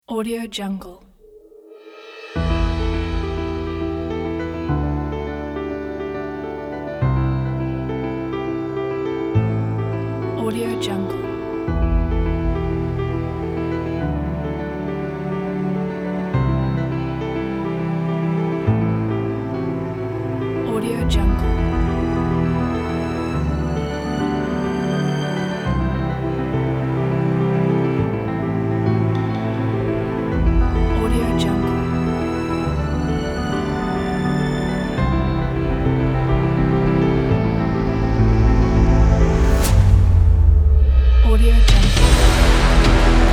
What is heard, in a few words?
epic cinematic trailer